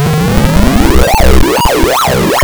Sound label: LEVEL DONE; Dangerous Dave